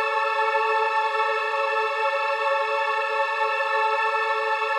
Pad Loop A, shimmer and sparkle; Pad Loops

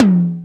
DMX Tom Hi, Oberheim DMX

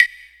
perc, Percussion; Trance Explosion Vol1

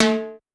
Timbale, Pitched Up; Clavia DDrum-AT

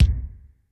ROOM K, ROOM KITS; Crypton; DrumBase5000

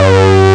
SYNCHRO; East West - Global Sounds; THE CAT

